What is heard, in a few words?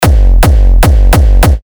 Kick F; Erosion; terra kicks; Revealed Terra Kicks